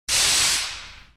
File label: Air blast